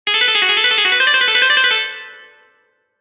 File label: legato, guitar